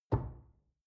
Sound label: drawer large trash close door